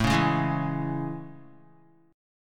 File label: A Major 7th, Guitar; strummed; guitar chord